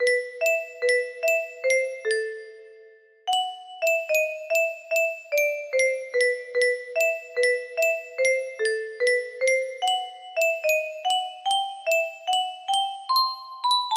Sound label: melody, music box